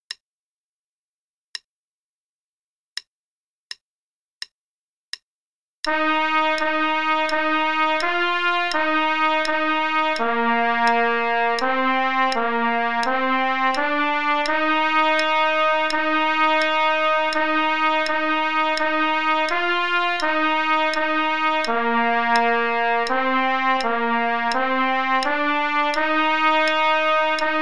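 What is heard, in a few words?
Play-a-long, quarter note = 84, trumpet; SOE Bk 1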